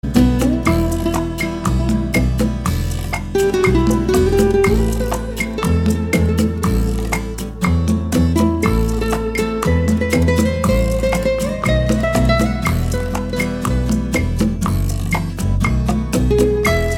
Красивая мелодия на звонок

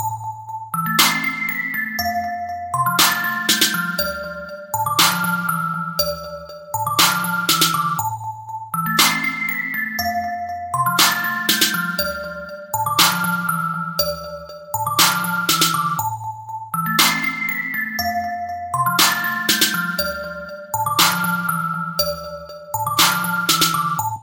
krasivyj zvonok

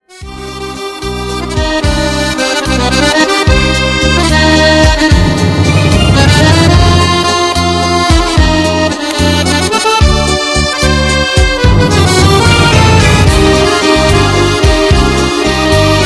мелодия на аккордеоне

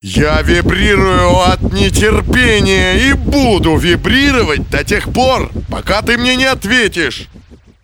Вибротелефон - Я вибрирую от нетерпения

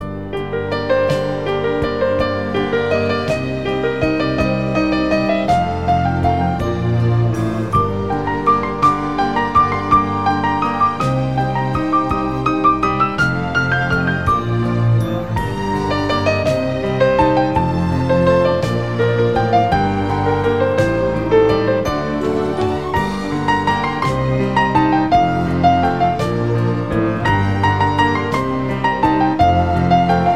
krasivaya melodiya na fortepiano

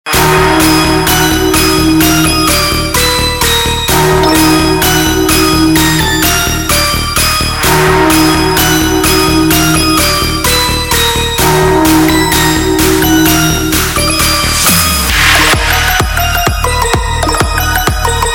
gromkaya melodiya na zvonok

